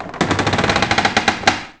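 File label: boat creak; envrn; The Elder Scrolls III Morrowind GOTY; XBOX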